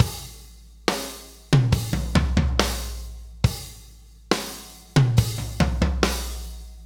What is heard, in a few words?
Loop, Drum Tools, Laid Back